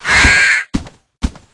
Bird Appear; Captain Ice; Cookie Run

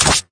jump

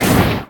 skill fire appear; agar agar; cookie run

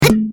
Jump; Cookie Run

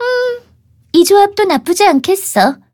levelup; cookie run

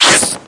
cookie jump; Snakefruits

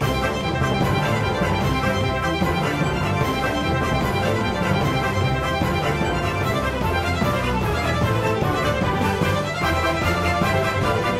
violinist; Bgm; Cookie Run; Bonus Day

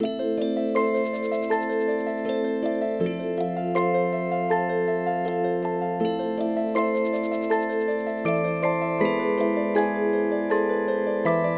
Bgm skin windarcher

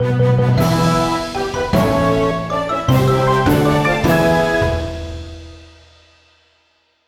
Jingle Win

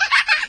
slide; Cookie Run

